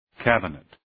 cabinet

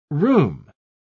room

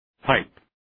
pipe

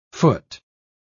foot

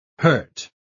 hurt

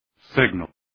signal

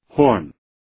horn

